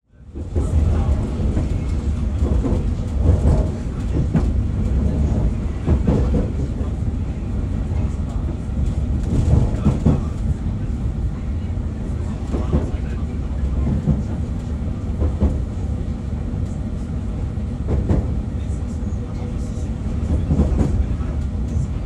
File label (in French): dans le train à Nice